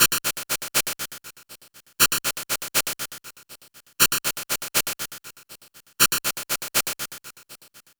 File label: Anabeat Hat; Beats; Sonic Foundry (Sony Creative Software); Prototechno